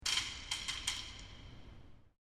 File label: Rachet